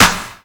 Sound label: drum hit clap